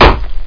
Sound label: concrete; Software Explosion; Fore-Matt Home Computing